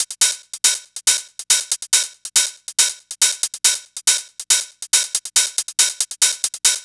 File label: NRG Cymbal; Sonic Foundry (Sony Creative Software); Trance NRG VOL-1